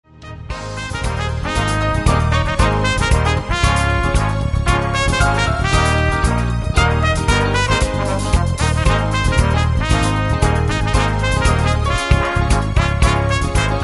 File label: jingle bells